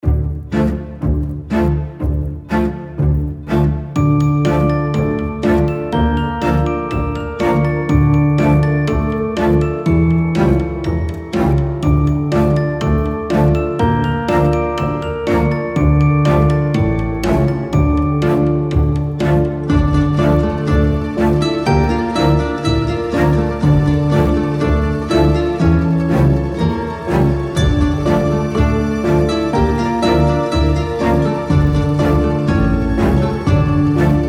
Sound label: Folk drama; Goliath